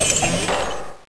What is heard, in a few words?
Empty Recycle Bin; Diablo; Desktop Themes